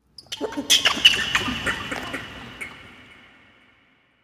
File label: environment creature cackle